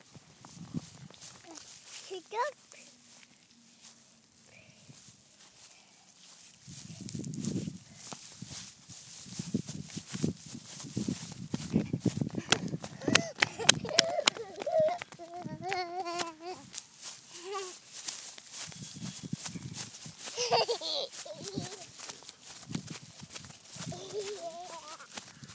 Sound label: crunchy grass